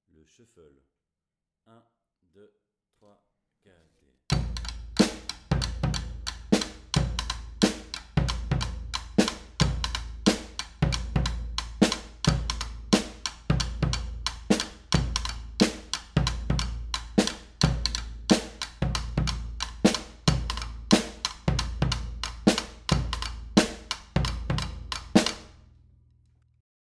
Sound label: Shuffle, batucada